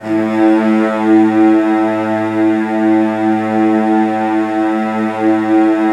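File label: CELLOS B2, Strings; ILIO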